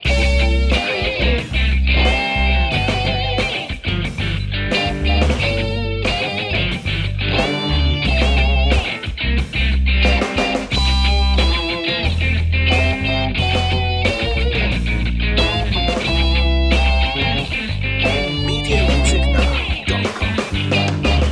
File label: happy birthday funk loop